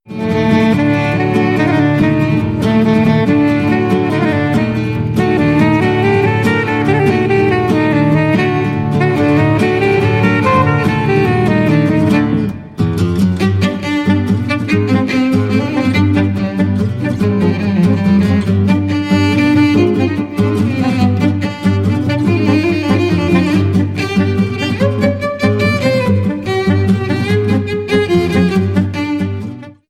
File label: trio; Musikagentur berlinklang; Live-Musik